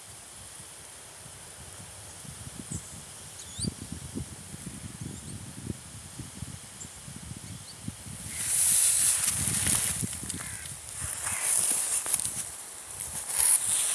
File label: Green Sandpiper